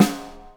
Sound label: snare ring; Greytsounds VOL-1